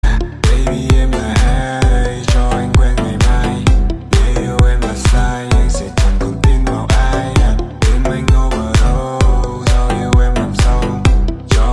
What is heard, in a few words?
Remix